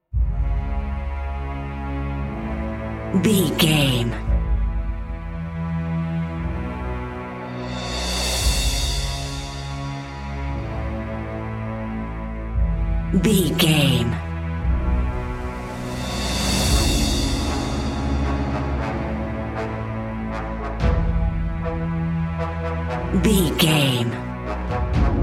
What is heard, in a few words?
cinematic corporate drum and bass mix